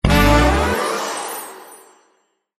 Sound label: Balloon harvest